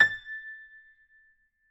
GPiano sus A5, Grand Piano, Kawai; Chordophones; Sustains

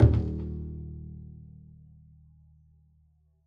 pizz eb1, double bass; dsmolken

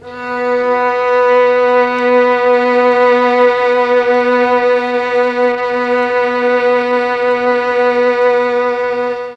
B3 300 VIOLA, mellotron; 300 STRINGS

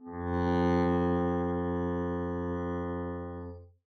arco f2 mf down, double bass; dsmolken

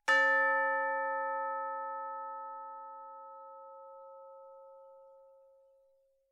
chimes A1, Tubular Bells; Idiophones; Struck Idiophones; Tubular Bells 3

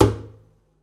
pizz noise click, double bass; dsmolken; noises

